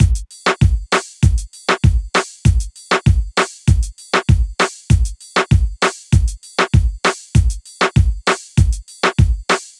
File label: Hip Hop Beat, Drums